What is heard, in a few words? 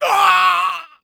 hurt heavy